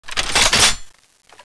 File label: rpd bolt pull; weapons